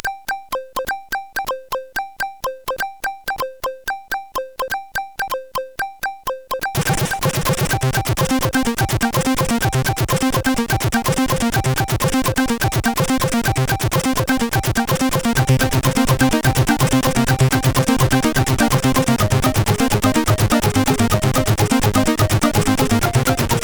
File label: Jingle, CSG8580R5; sid